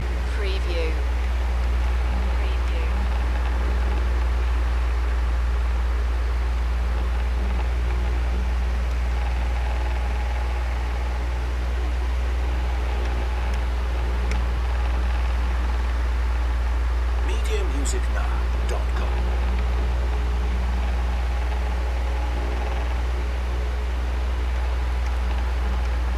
room fan loop

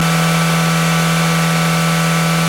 Car Motor Loop RPM 6000 Engine Perspective

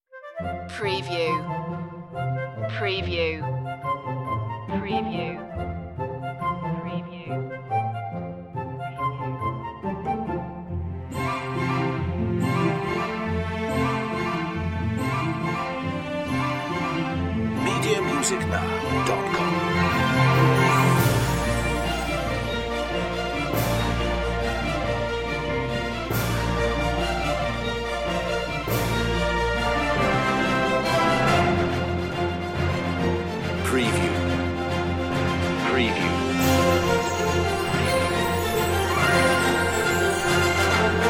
no choir

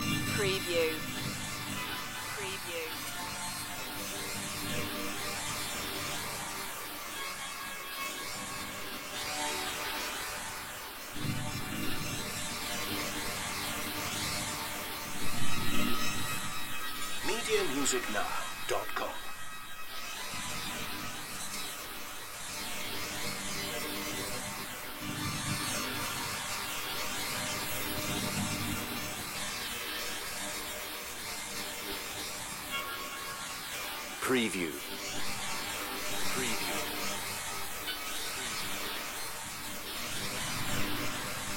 abstract dissonance background loop

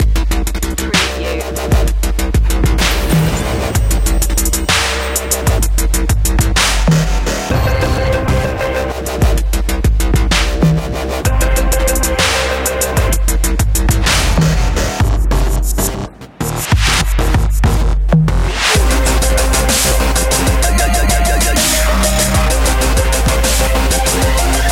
dubstep industries

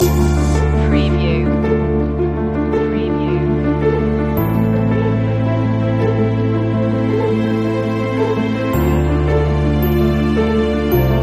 river of reflections loop

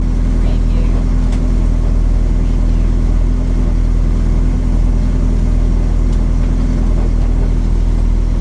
Rally car tickover loop; beanstalk audio

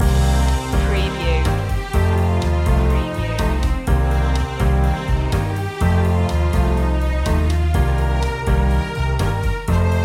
daytime stroll loop

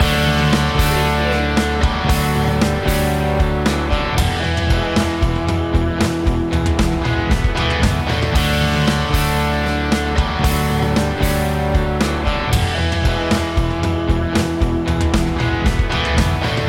cruisin loop

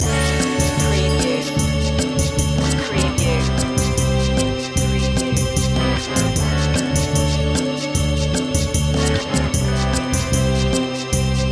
cocktail loop

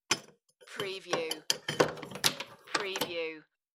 Insert Big Key Into Keyhole

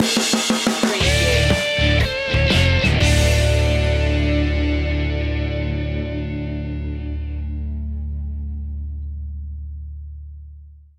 rough up stinger

